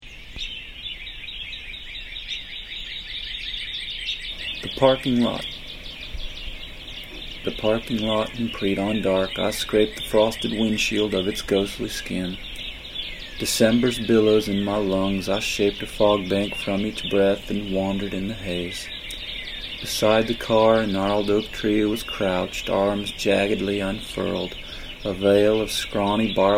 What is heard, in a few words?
the parking lot birds; poetry